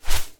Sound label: Flap